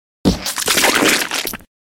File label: splat